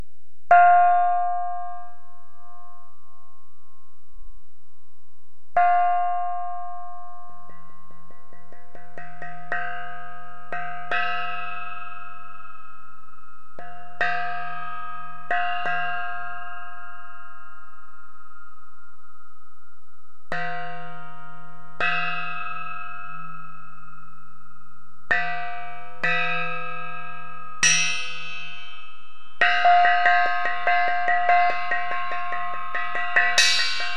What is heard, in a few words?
gong china